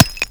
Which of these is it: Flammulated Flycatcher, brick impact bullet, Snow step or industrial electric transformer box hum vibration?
brick impact bullet